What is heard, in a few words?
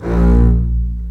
String Collection, MARCATO; A#1; East West - The Ultimate String Collection